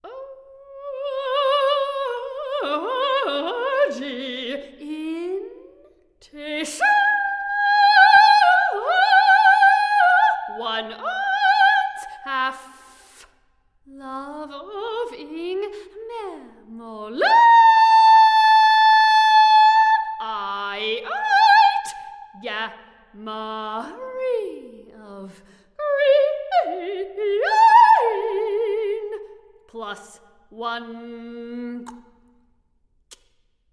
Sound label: micro-opera for soprano alone